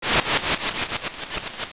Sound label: Evil Eagle Fly Away; Evil Eagle flying away after being hit